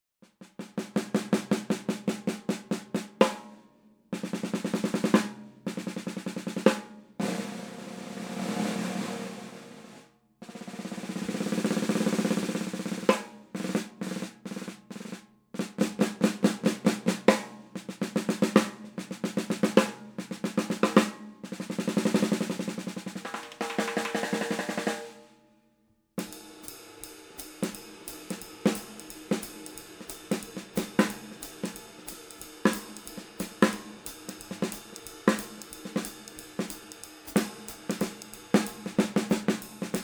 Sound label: Ludwig 5x14